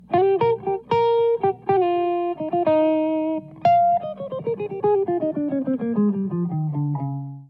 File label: Riff